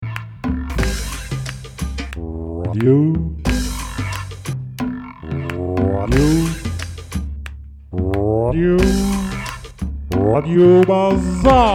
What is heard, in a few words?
jingle; bazaar